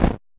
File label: footstep snow